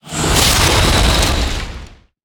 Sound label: Death